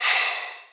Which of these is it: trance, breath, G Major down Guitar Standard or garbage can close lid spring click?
breath